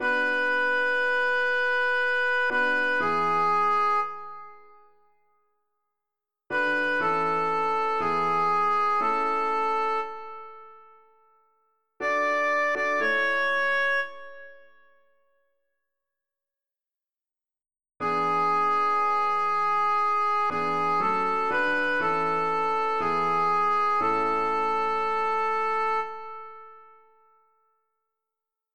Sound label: kyrie soprano